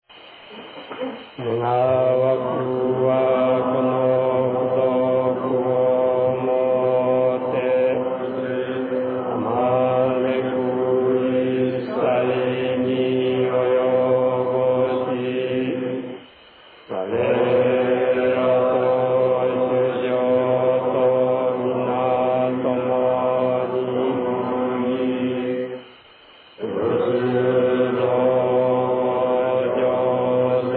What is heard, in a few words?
end chant bells han